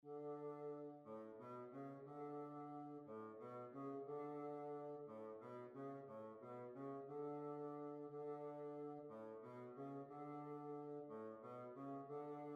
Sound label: Waltz; Lord St Vincents Waltz